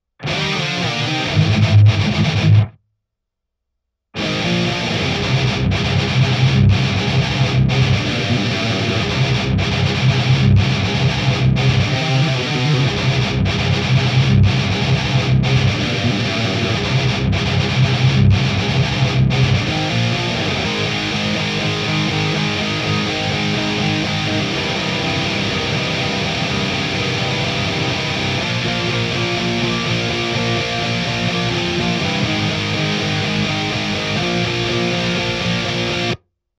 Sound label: 4 GITY MERGED REAL CAB